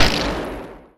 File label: Laser Gun